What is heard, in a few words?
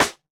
snare samples, Rock